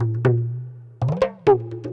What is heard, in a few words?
PERC LOOP ETHNO 125